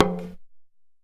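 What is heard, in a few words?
small tama hit; Best Service; World Colours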